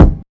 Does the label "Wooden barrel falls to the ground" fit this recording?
No